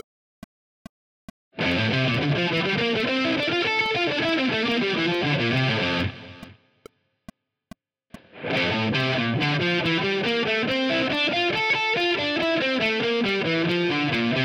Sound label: triad arpeggios